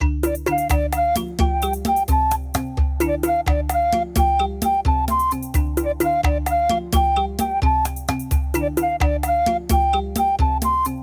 Background Music; The Jolly Gang's Misadventures in Africa

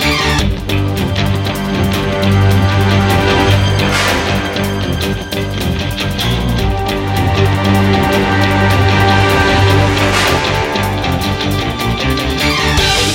Boss fight; talking tom gold run gamerip 2016

